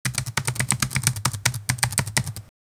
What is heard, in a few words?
typing on keyboard; human activity